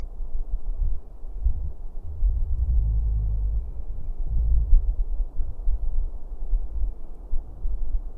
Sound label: Kamloops city sounds from Tower Trail